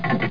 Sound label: door mt op; landslor